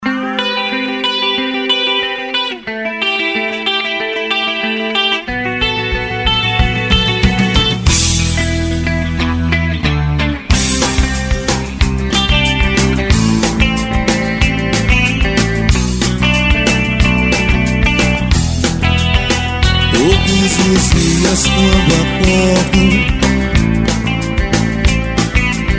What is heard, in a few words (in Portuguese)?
rock nacional medley